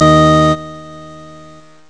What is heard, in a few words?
accordion; Amiga Soundtracker Sample Packs